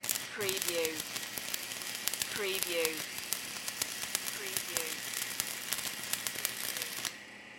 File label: Welder Run Sparks; Sound Effects Bible